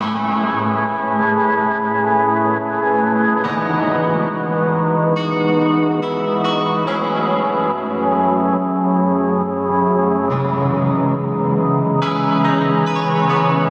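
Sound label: 140 guitar synth C minor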